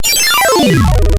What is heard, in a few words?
Coin